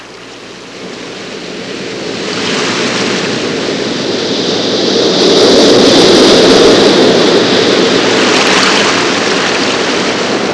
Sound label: sea wave